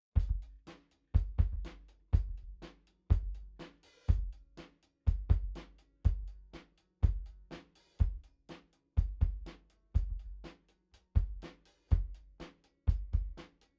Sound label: kick drum